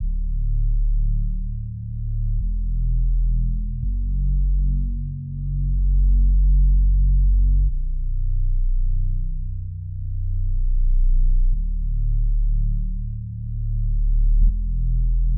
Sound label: 125 BPM F# Min Bass; Loop Stems; Cymatics; Only You